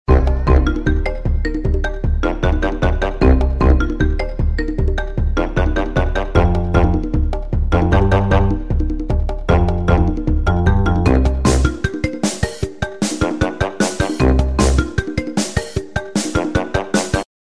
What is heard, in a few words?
Menu Ringtone; Crash Bandicoot